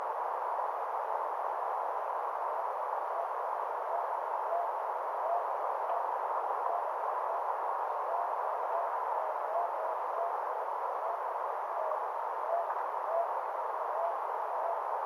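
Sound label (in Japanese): aobazuku, northern boobook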